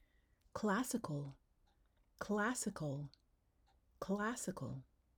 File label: classical